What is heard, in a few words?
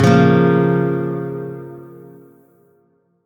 Shawzin Hirajoshi Chord E; Music; Warframe; Lotus Shawzin